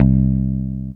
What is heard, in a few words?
bass